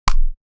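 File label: short soft impact sound; shooter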